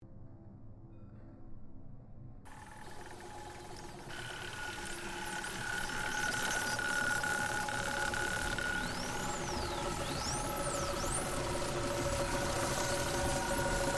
Created ambience, Various Ambience created through sound manipulation; Artificial; Created Ambience Circuit Trap; in FMOD